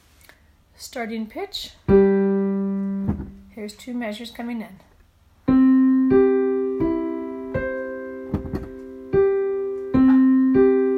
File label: Goodnight Accompaniment